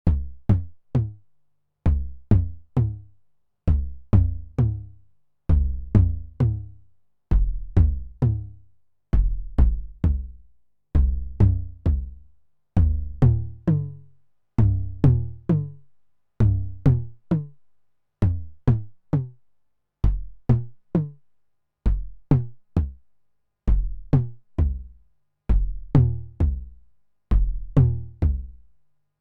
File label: TOMS